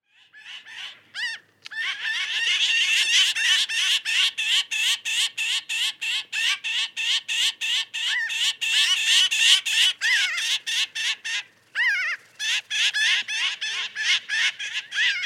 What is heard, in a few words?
Pinyon Jay